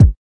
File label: kik